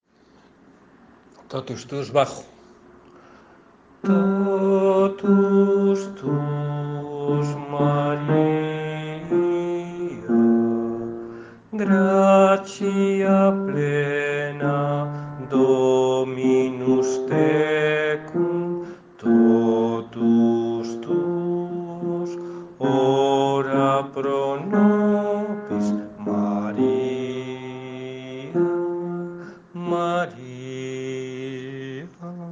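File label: totus tuus bass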